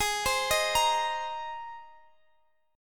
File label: Ab Major Add 9th, down, Guitar, Half Step Down; arpeggiated; Abadd9 Chord